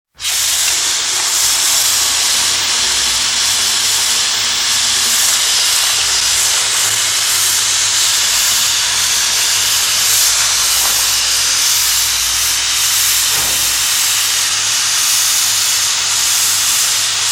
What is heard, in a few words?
household hose fills plastic bag with water; sound effects one